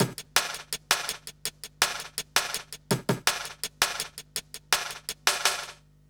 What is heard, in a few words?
165 BK, Explosive Jungle; USB Soundscan vol.05; AKAI; 90s sample CD